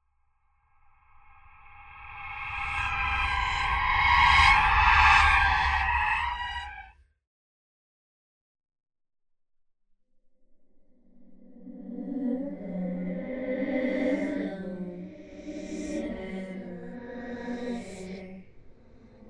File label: Auxiliary Voice